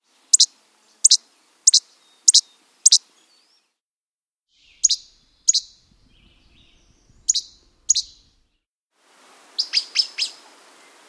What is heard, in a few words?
Least Flycatcher